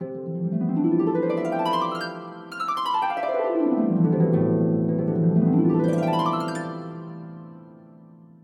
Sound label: wedding season; Google; Google Sounds; Seasonal Celebrations; alarms